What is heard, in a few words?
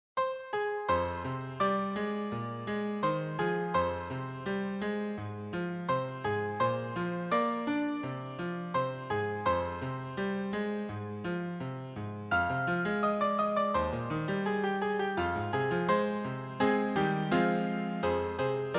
ukali orali haru ma advanced solo sheet, piano